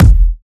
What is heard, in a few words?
loud bass drum single shot e key; kick drum samples